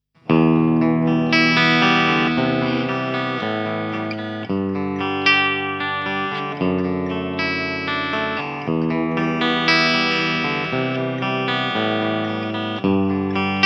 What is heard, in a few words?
Rickenbacker 350V63 Clean Bridge Pickup